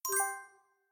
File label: Dial Tone; LOOPERS